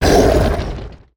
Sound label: Darkness King hit; Swamp Of Darkness